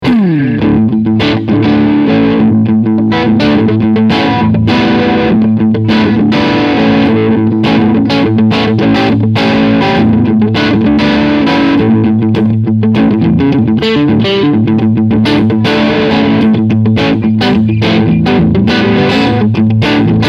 Crunch Front SC

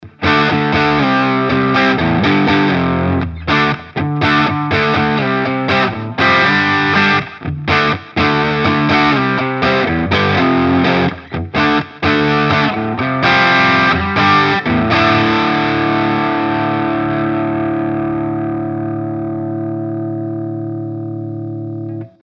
driv in gain 12h rest 12h

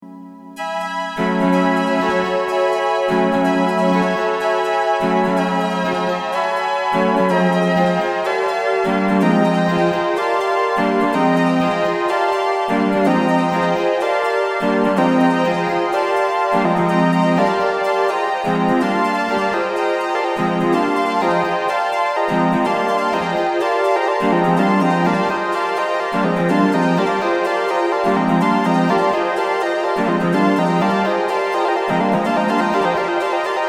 LFO CC Pitchbend

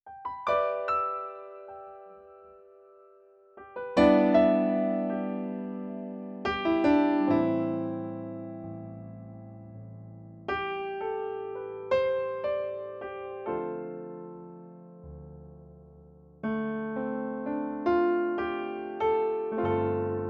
CN34 Concert Grand

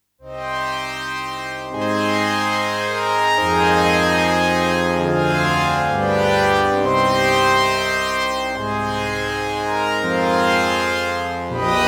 Pad SEM Bandpass

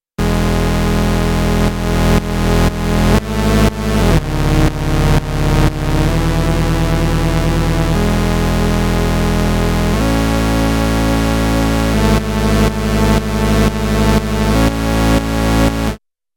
E Pump Bass; KOPRO+

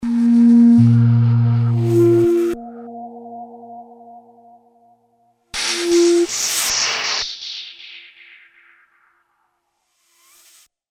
Horn Breath

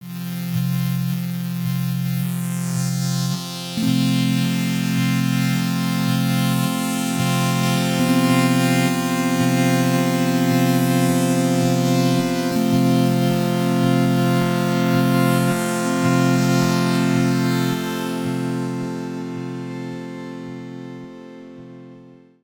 Wavetable; Ableton

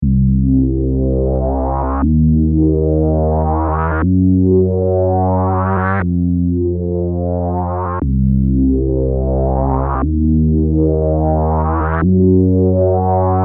Bass Station

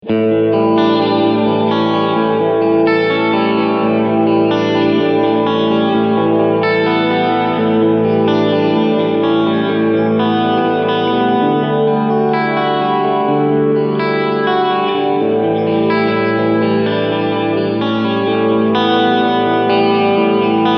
Plate

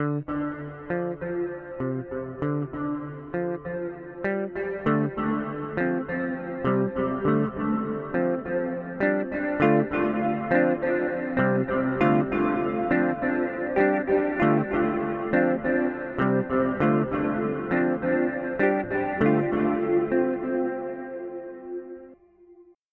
TC Electronic Hall of Fame 2 x4 Mod

